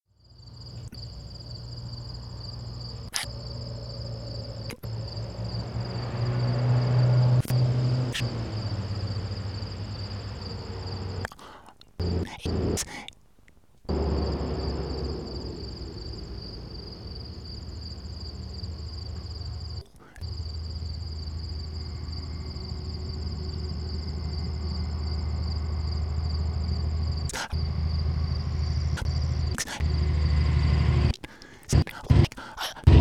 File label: air bug machine